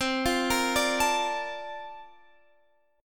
Bb Major 7th, C; Bbmaj7/C; arpeggiated; JGuitar

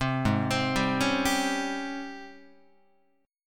G sharp Major 11th G sharp, Guitar; 3,4,3,5,5,6; JGuitar

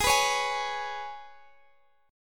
Ab Minor Double Flat 5th Ab, Guitar Open D; x,x,18,17,16,18; down

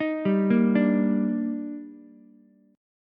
D sharp Major 7th, Ukulele; arpeggiated; JGuitar

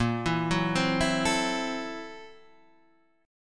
Fsharp 7th Sharp 9th Flat 5th, guitar chord

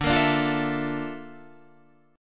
A sharp Major Sharp 11th, D

